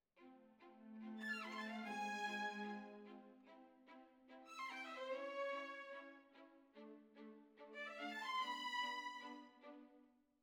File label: Violins Over